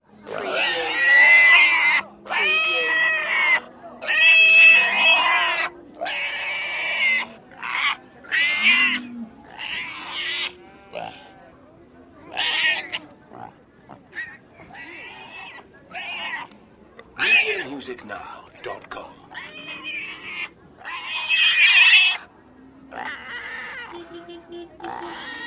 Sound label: screaming pig